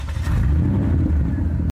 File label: turn, car sound